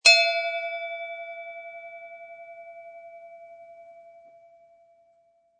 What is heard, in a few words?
Bell Ring Single; Bell ringing; Bells (Sound Effects:Musical)